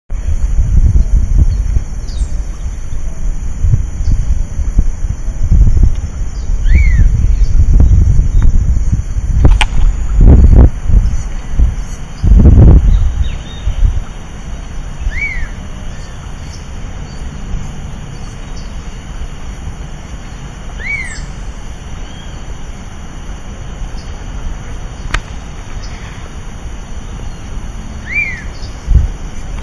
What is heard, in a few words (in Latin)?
Elaenia spectabilis; Otamendi; Jorge La Grotteria